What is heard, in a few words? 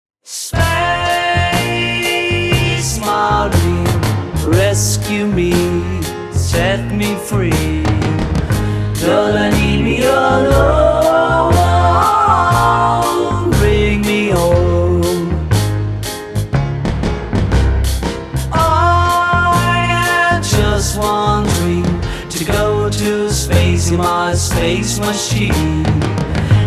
Vocal Test